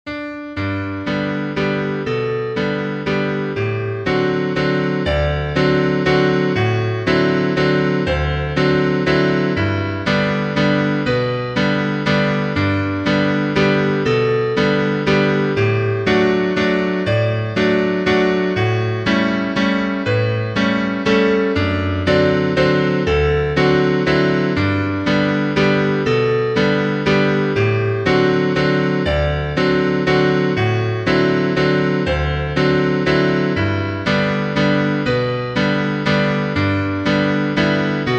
PIANO

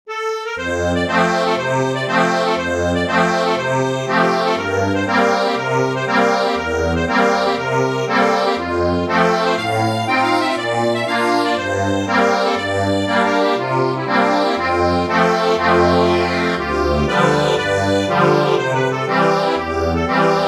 accordion

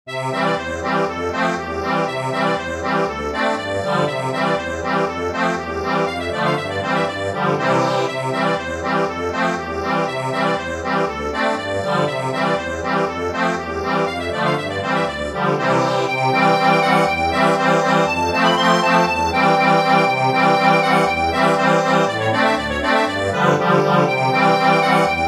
accordion

